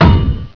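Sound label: metal to metal; Hexen2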